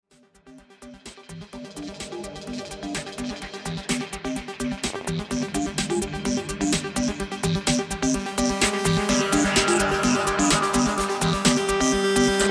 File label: electricity only